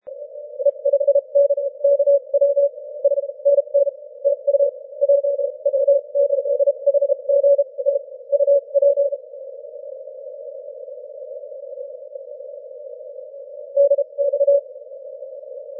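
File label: 30m continuous wave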